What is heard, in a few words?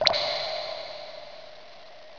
drip